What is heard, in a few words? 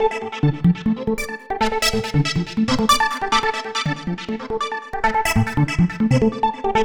Synth Soul